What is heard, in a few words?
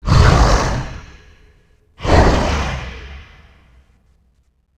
breath; glasgavelen